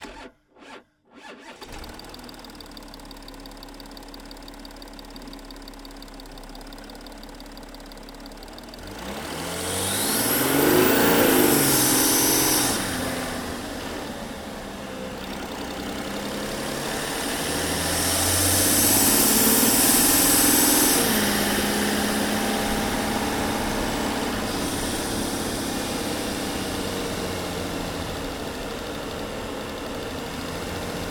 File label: Audi Q7 ONBOARD Driving Sequence With Various Accelerations And Decelerations; ENGINE INTAKE; MikroUsi